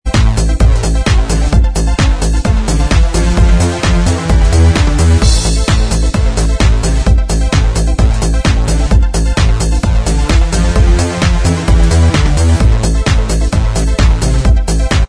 Inst

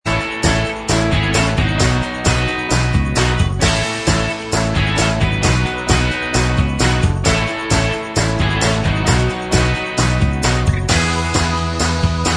Inst Track

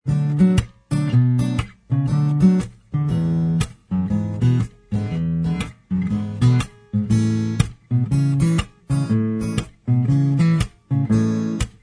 acoustic Inst

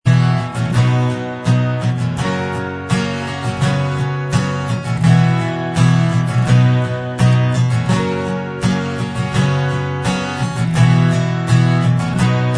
acoustic Inst

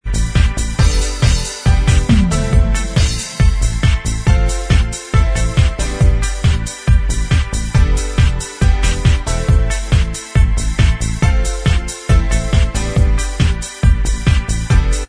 Inst Track

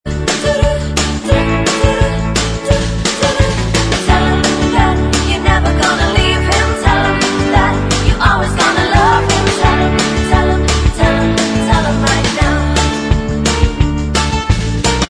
Bvox Track

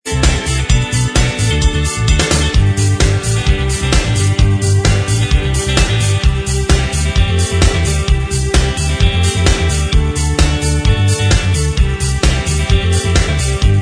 Inst

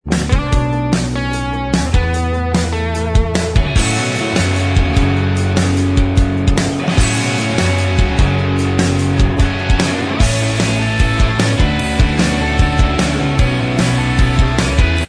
Inst

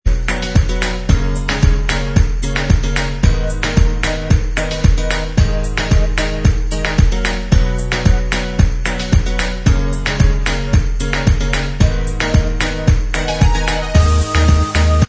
Inst Track

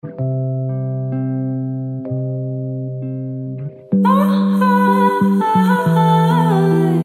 B Vox

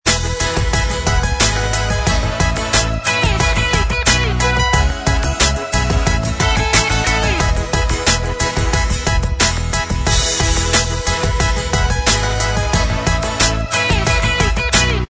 Inst

